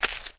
Walk; Bob the Robber 2

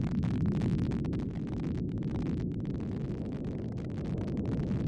fire roaring; adarkroom

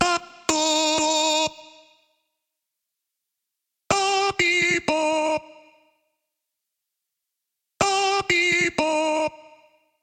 Voices; fridaynightfunkin; dad erect

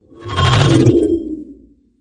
Leave Screen On Asteroid; crossyroad

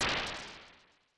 asteroid hit; adarkroom

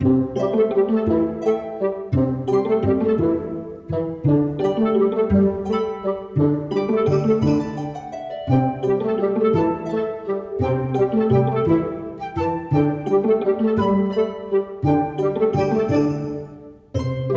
game music; cuttherope-holiday

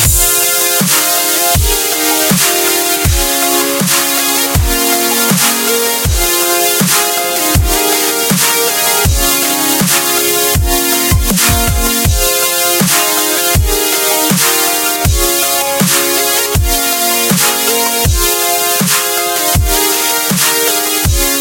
win; tekpro

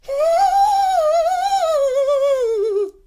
peep hngh; wbwwb

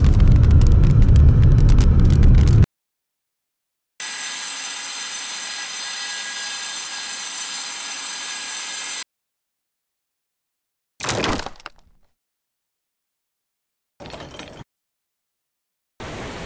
track sfx; Temple Run 2

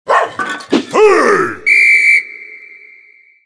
guard start; subway-surfers-ny

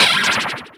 perfect fail; thirtydollarwebsite

